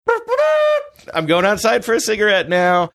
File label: making mouth trumpet fanfare saying im going outside for cigarette now